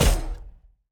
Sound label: Knife Hit; Assassins Knife; Lunar Veil